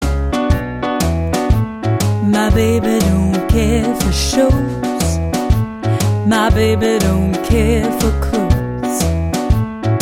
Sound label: Jazz Vocals